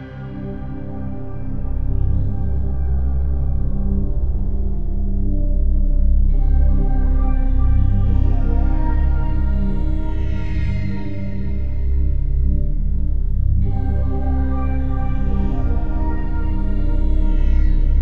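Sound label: Deserted Streets, dark ominous music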